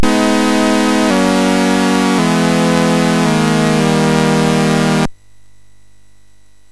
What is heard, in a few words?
MOS6581R3, GAMES; Waterline; HVSC